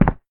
Tile short; Devour

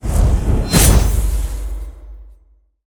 shadow kunai

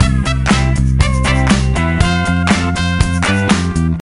Flourish; Happy Feet Two; NDS

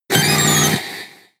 Growl; pokemon sfx gen 6 attack moves xy oras

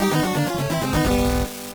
Player Die

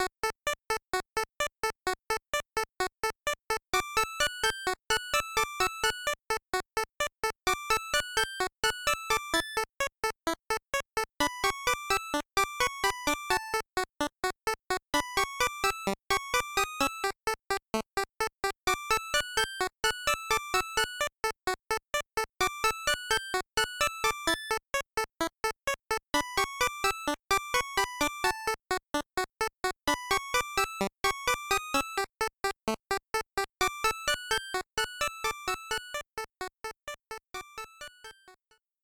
Lullaby; Infernax; PS4; Xbox Series X/S

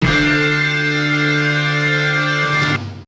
Over Game Over; Street Hoop; Neo Geo; arcade

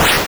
Melee Attack (Sound); Gloom

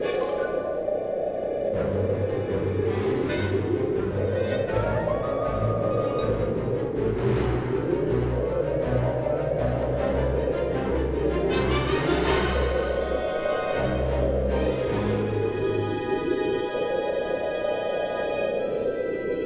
Action Chase Music

